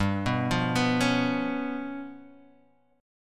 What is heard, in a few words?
Db 7th Flat 5th G